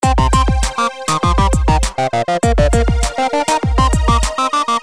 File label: Guit